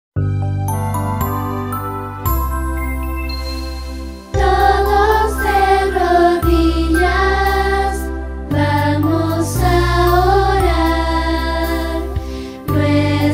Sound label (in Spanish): Canto Para Orar